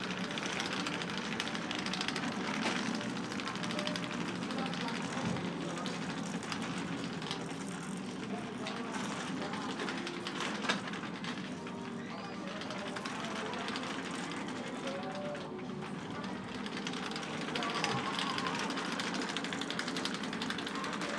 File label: dragging the shopping around wilkinsons